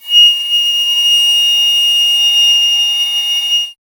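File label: flute piccolo; Miroslav Vitous - Solo Instruments VOL-2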